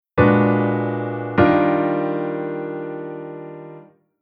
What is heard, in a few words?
Chord Tension Minor bVII7 to bIIImaj7